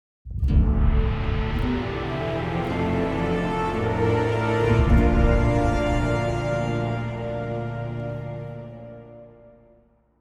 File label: Exploration Positive